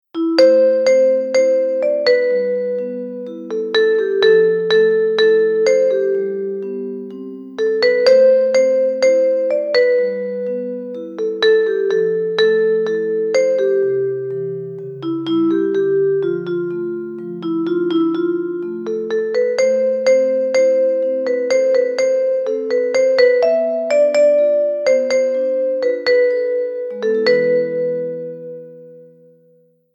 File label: RingTone